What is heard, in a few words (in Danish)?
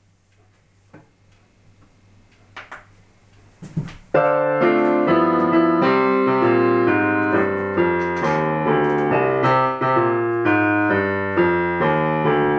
sopran